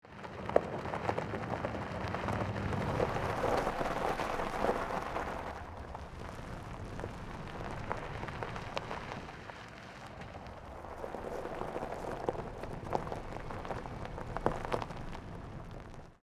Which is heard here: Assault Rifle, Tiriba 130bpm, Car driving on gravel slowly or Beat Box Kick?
Car driving on gravel slowly